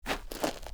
dirt shuffle, footsteps; chief

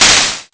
shot; Nintendo

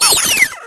dolphin; Nintendo; wr64

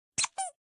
Cartoon Squeak Sound Effect; VRChat; Unity Usable Avatars

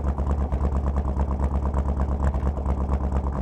warthog hotrod idle; vehicles; loop

